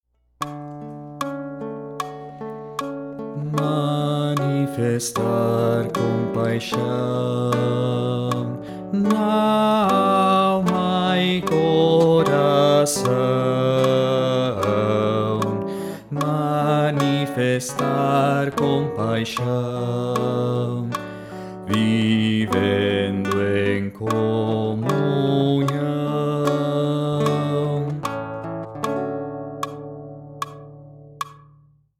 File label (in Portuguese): Baixo